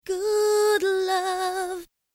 vocals dry

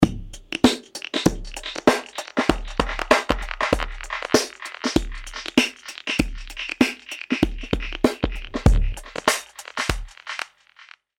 Drums filt Del; BP filt Del; chromasonic

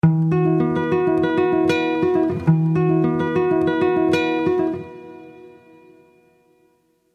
guitar concert

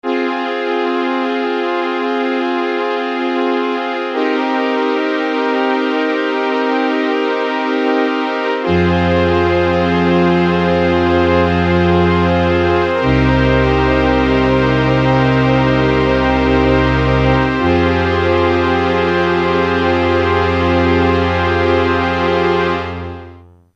strings dry

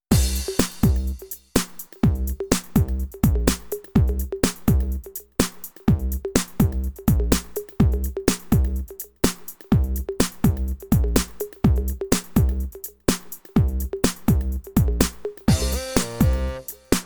Dirty Electro

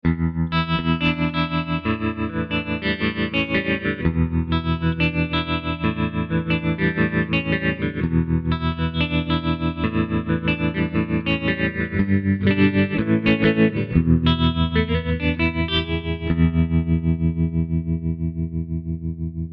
Clean Tremelo

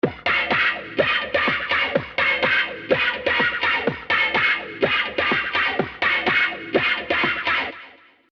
House drums; Slyamp; ampomatic

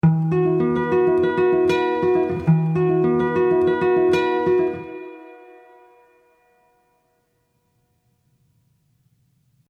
guitar air hall

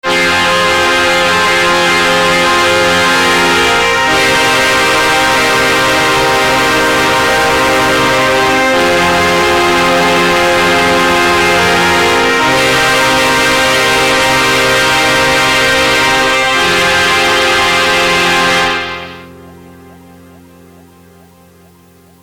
strings sunrays

